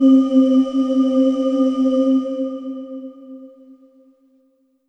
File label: Korg M3R Glass Voice C4